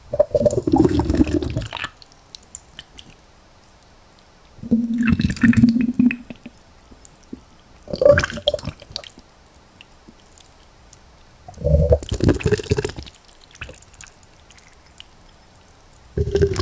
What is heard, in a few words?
the inside of an extremel, human body